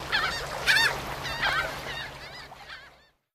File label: random gulls; random amb sounds